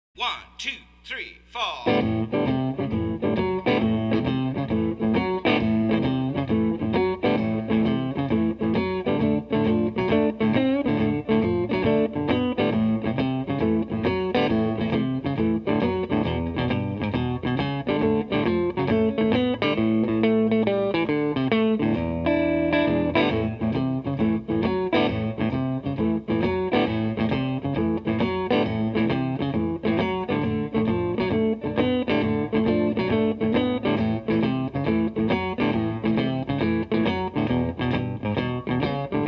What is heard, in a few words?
A Shuffle, harmonica; Blues Harmonica Masterclass